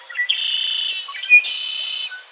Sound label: bird, ambient animal